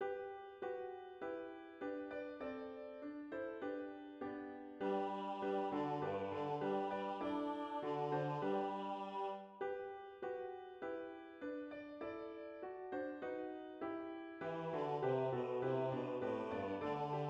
basses; les anges dans nos campagnes; traditional

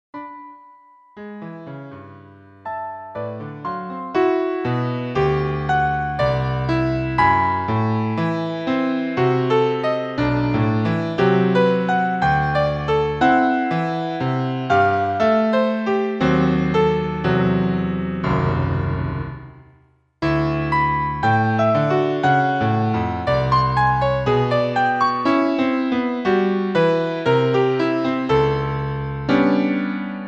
piece pour piano; vignon denys

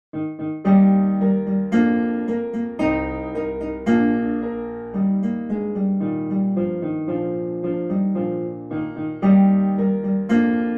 ecossaise; beethoven ludwig van

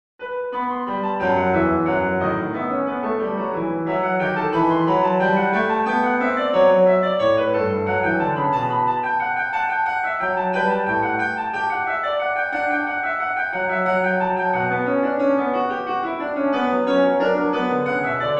invention en re majeur

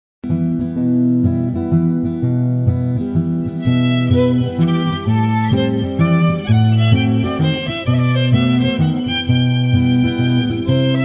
duo violon guitare; oloron

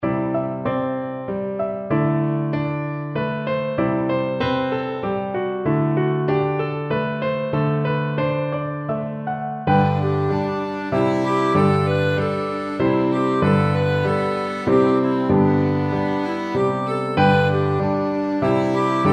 menuet

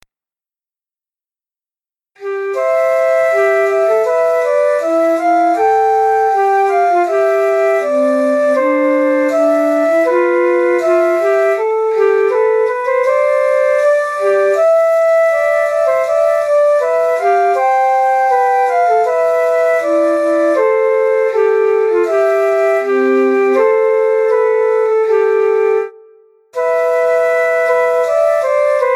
hymne russe ukrainien; verbytsky mykhailo; tous freres

